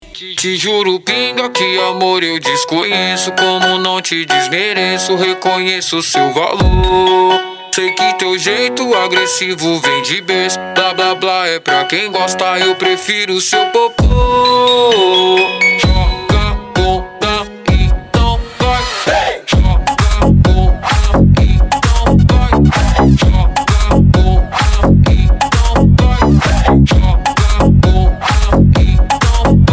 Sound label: retro